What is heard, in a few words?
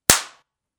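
bursting balloon sound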